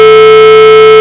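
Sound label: square wave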